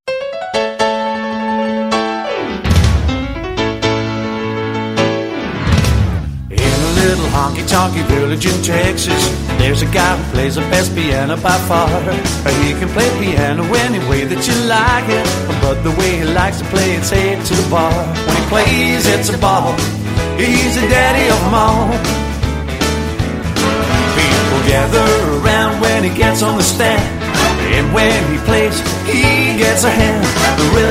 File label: PIANO BOOGIE